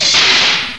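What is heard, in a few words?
small door; lmctf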